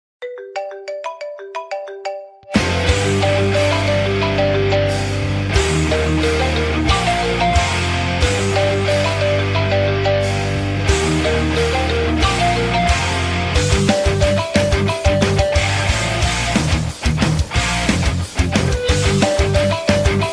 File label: iphone metal remix ringtone